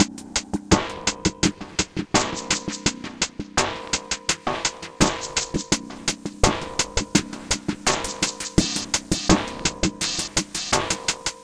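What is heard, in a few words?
alien tech beat down 1 octave; FRENETIC FRACTAL GROOVES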